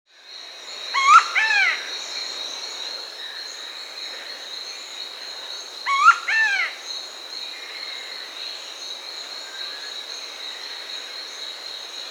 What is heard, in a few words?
Scaly-breasted Woodpecker (Celeus grammicus), Bolivia; S. America